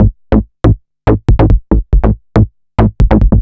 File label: bassline, dance